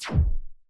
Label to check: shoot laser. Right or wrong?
Right